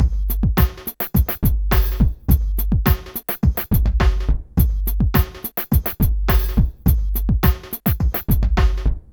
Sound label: PHATT BEA, Hip Hop; E-MU Formula 4000 Series Vol. 1; Hip Hop Nation